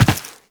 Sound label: Land Default, Footsteps; Warframe; Tenno